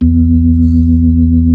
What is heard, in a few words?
B-3, Club